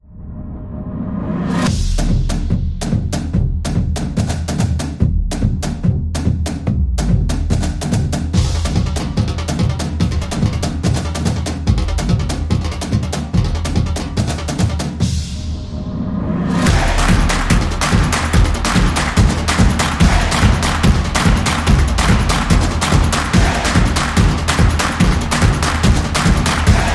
Latin America

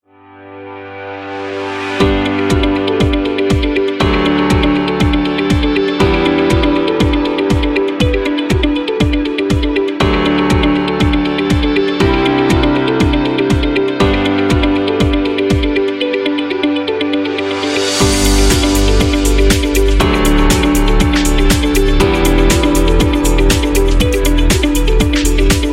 Commercial Upbeat; eco-friendly

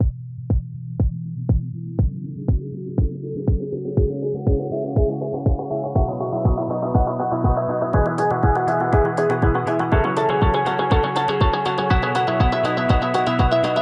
Optimistic, corporate music; corporate music vol 19

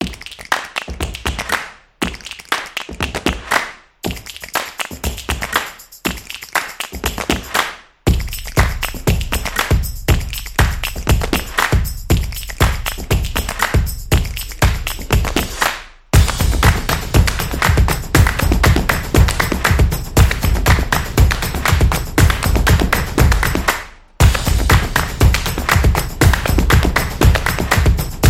Clap Drum

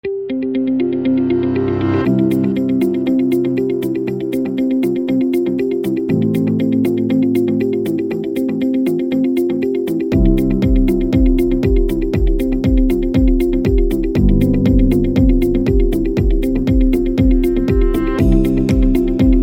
Corporative, corporate music; corporate music vol 4

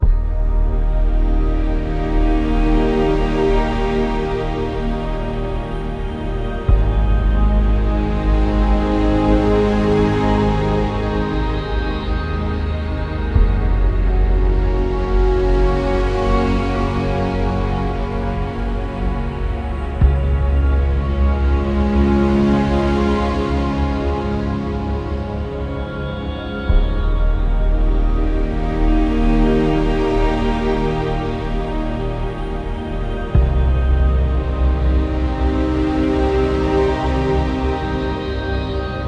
Meditation; epic music vol 3

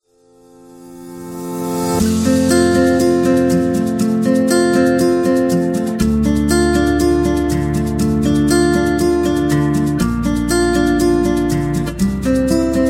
Acoustic Background

